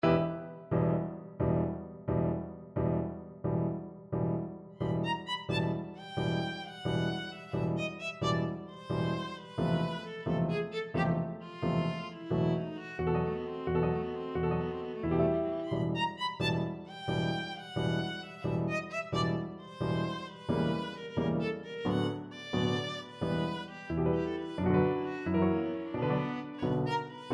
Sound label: rondo capriccioso, viola